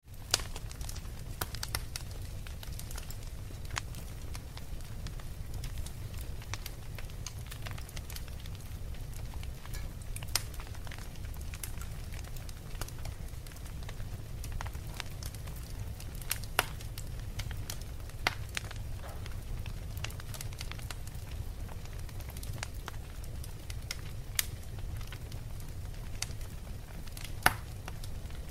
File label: Burning fireplace and crackling fire